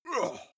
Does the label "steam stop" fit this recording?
No